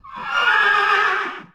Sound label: horse death; fauna